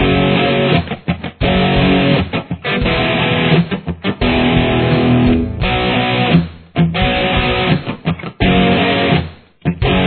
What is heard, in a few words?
Verse Riff, guitar